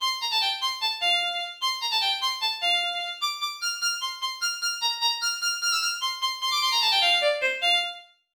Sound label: Fiddle Game Freestyle